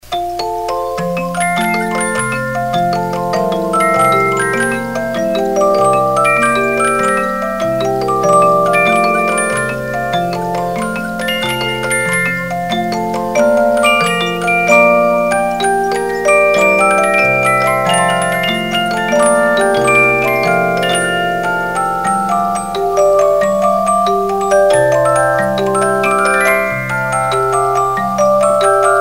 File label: Blue Danube Waltz, 50 Note Movements